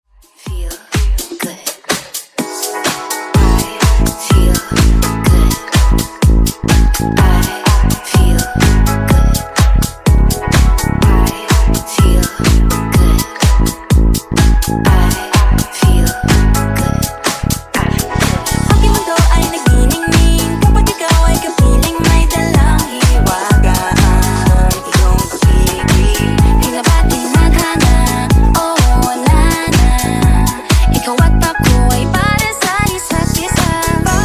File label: Funk Nudisco 125Bpm